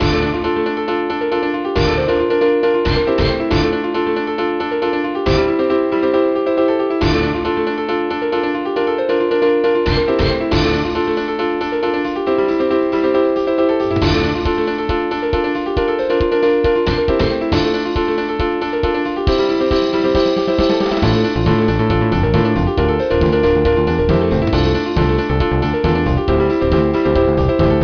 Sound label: Techno Remix 11025 Hz; Sonic Robo Blast 2; DOS; Fanmade; Techno Hill Zone - Act 1